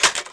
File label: menu up; Tony Hawk's Project 8; XBOX